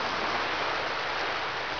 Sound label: shore; Caesar 2; DOS